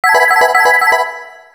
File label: Serene tone